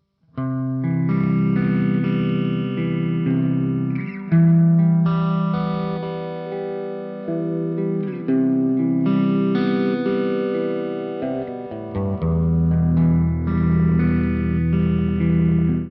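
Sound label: P90, guitar; TwinR; ReTube Custom Shop; SHOD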